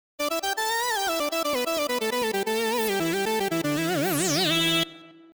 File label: Noise Lead